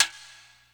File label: timbales